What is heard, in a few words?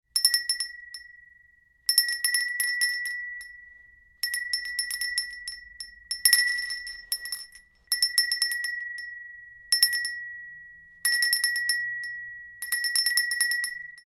Ceramic Bell Small, Small bell tink ling - various; Musical; Tom Hutchings